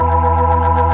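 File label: B3 E, organ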